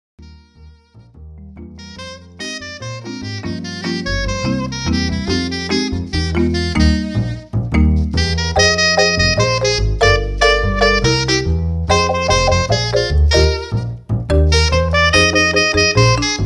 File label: Keyboard, sax jazz; Lyrica Demo